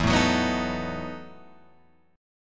E Major 7th Suspended 2nd Suspended 4th chord, Guitar Open F; EM7sus2sus4 strummed